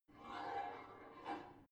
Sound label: barbell heavy plate pull out slow, fitnessstudio; sport freizeit